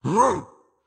TripleSwipe WindUp; Caffiend Caelan

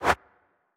Rotate

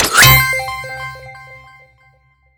Star Reward

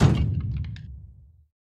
Bounce Metal Heavy

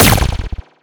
Shoot; EyeBrawl SFX Shoot

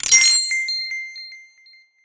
Gem Spawn; Skylanders Cloud Patrol; Arcade, iOS, Mobile

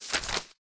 Open City Tour Map; Subway Surfers City Sound Effects; Android

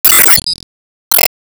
Enemy Bombbumpo Active Blast

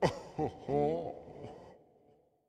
Toxic Mask Small Laugh

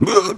Shock Bah

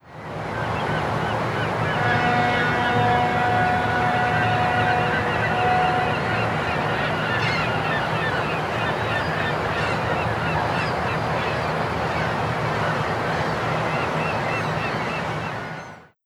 Miami Harbor

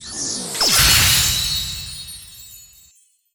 S Rank Complete

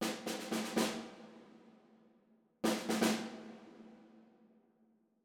Stinger; Sudden Strike 4

